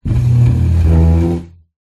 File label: household chair dining rubber feet drag slide wooden floor